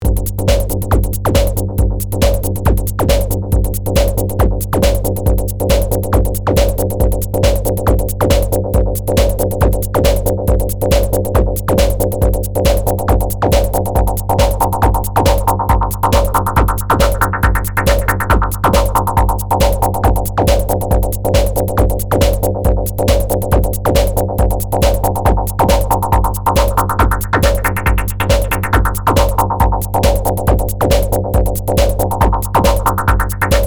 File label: Filter Chord House